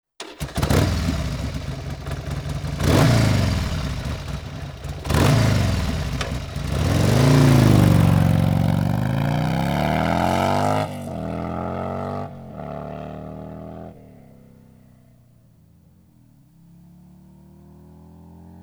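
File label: Bruell S1 Lightning, motorcycle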